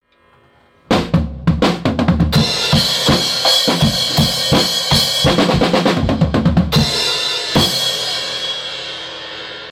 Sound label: Drum jam